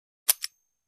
Empty Weapon Sound

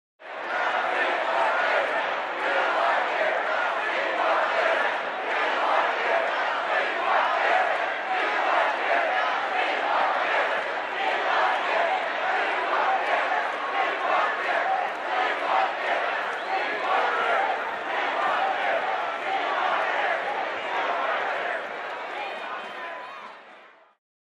People Protesting

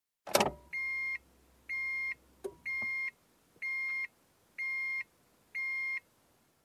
Car warning tone

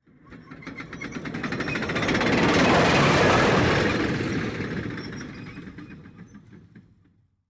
Conveyor Transportation

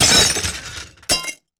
Trashed glass shattering

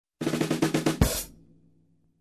Funky Drum upbeat

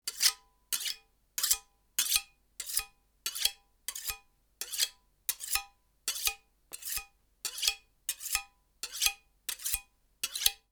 Sharpen kitchen knife

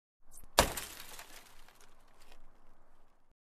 Rock Impact

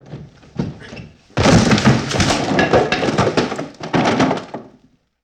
Fight Sequence with scattered wooden debris

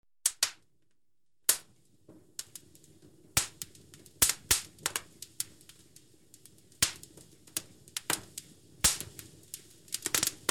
Cracking firewood sound

